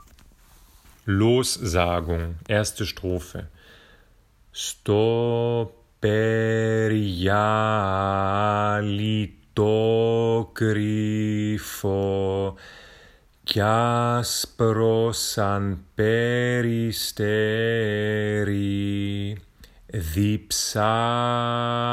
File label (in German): Lossagung